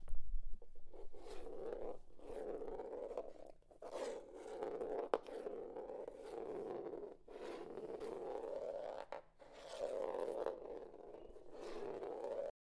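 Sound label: Golf Ball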